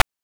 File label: PITCHED NOISE; NES DPCM; MIDINES